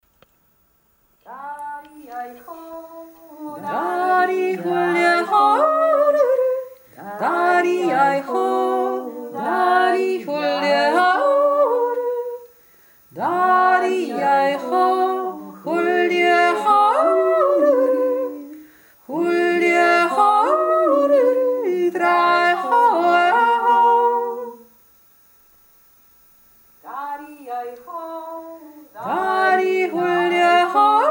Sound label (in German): dariaho dreistimmig